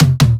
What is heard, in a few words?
tom dub fill; one shots; SEISMIC Drum Sample Pack; Slate